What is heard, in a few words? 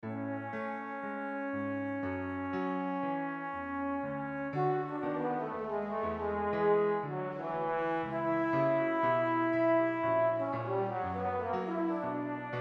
spitfire air, BML BONES MAIN MICS; Spitfire Audio